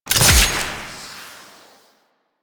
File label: Grapple Throw; Enemies; Weapons; DE; Warframe; Teshin